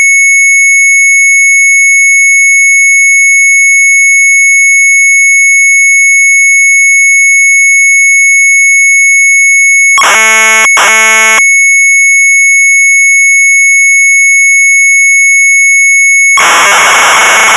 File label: MZ800 Tape, sharp; schuif=eens; retromelsarchive